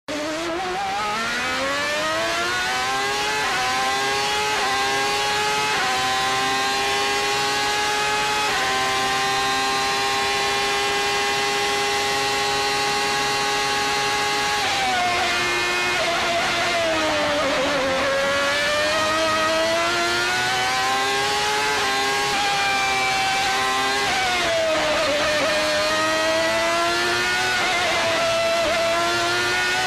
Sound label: Ferrari F1 V12; 1995